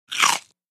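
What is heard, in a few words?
Single Short Bite Celery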